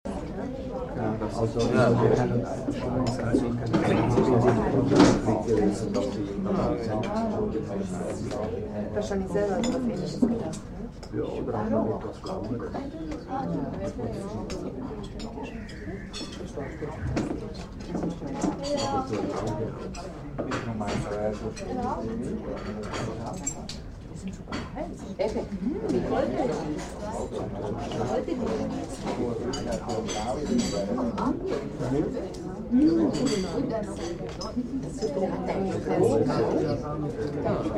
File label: Swiss Restaurant Medium Crowd; Interior Crowds